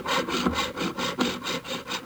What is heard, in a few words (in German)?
Handsaege